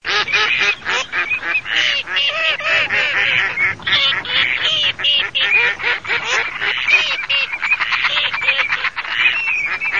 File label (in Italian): alza germ, birdsound